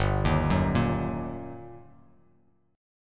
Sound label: G Minor Major 7th Sharp 5th, down; GmM7#5 arpeggiated; JGuitar